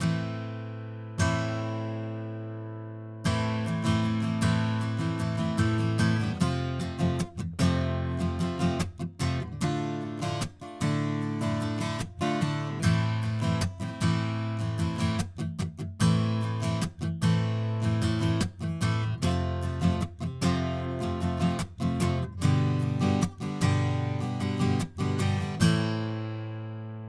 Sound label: Amin 150bpm Acoustic Guitar Chorus Outro